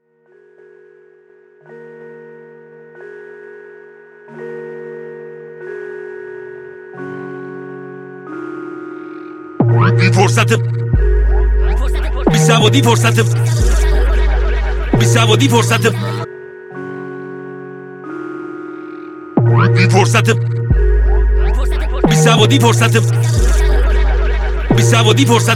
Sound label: remix rap farsi